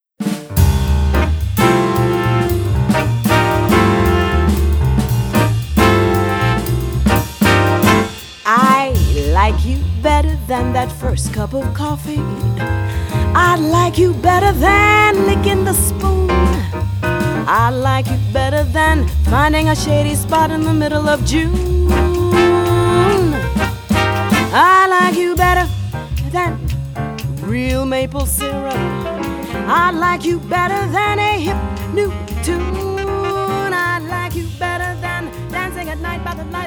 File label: jazz; Demo Reel - Jazz